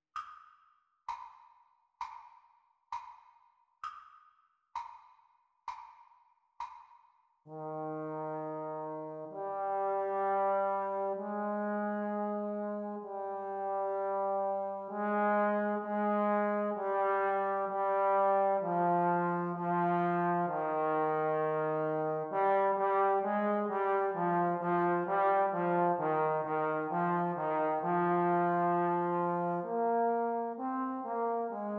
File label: Playalong for Eb Horn Practice Speed